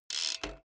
bmx bike crank freewheel fast short

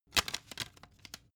cd in cover fast

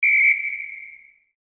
whistle

